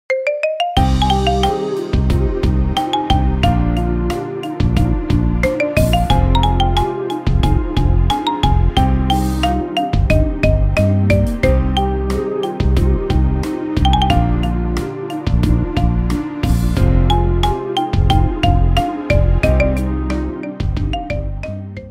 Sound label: Marimba Cover